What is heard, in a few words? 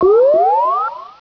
poof